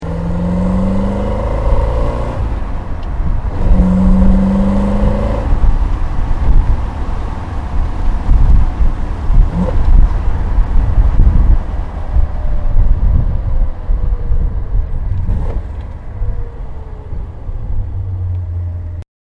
Chevrolet Corvette Stingray 327

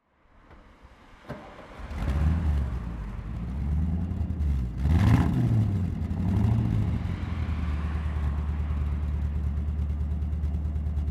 Ford Fairlane Sunliner

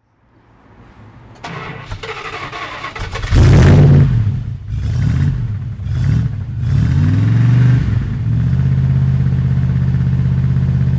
Jensen Interceptor 1974

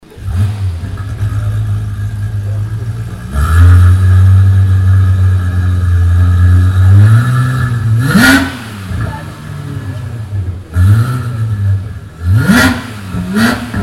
Porsche 911R Typ991; Porsche Soundnacht 2016

